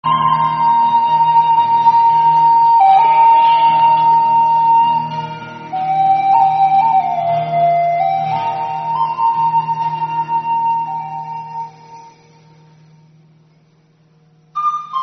Zulu Warrior, Instrumental Only; Good Shield

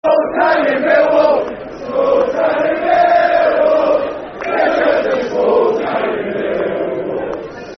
Football Chants, Plymouth Argyle; Small Town In Millwall; Plymouth Argyle Pafc

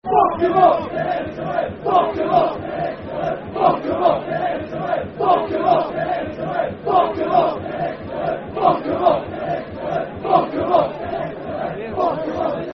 Get Into 'em, Football Chant (Soccer Songs); Darlington Dfc

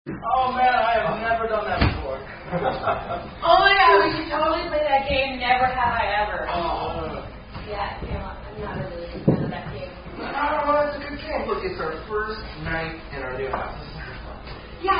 drinking game

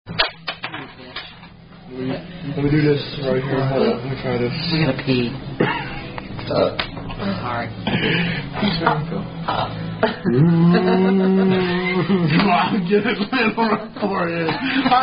playing quarters

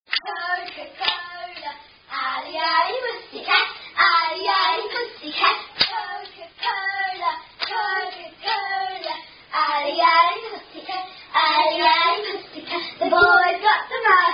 Playground Rhyme, Children's Hand Clapping Rhyme; Coca Cola Playground Rhyme

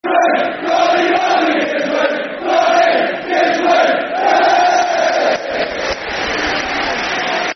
Football Chant (Soccer Songs); Ronnie Give Us A Wave; Tranmere Rovers Trfc

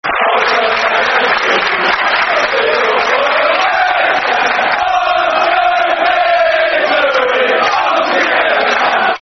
We All Follow The West Ham, Football Chant; West Ham WHFC

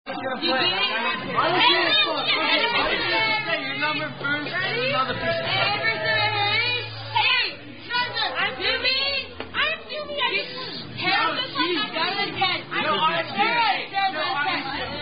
Drinking Game With Women; Zoomie Zoomie

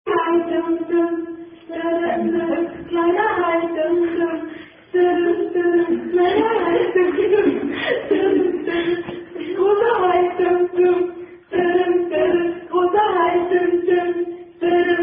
German Attack Song; Baby Hai Dimm Dimm

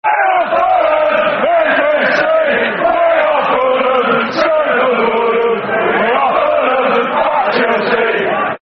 We Are Fulham, Football Chant (Soccer Songs)

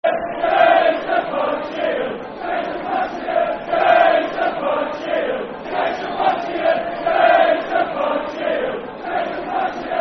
Football Chant (Soccer Song); Milton Keynes Dons Mkdfc

